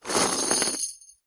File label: industrial chain med pick up from wood panel; zapsplat